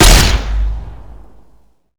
laser tribeam fire, laser rifle; weapons

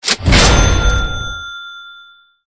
fortifications cash spend; gow; horde common

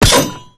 gatling heat steam vent open; gow

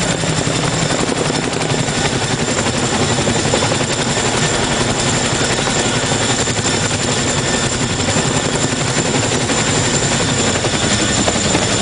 external, ch47; wac

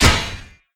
sword pickup

